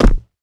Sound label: land rubber, footsteps; player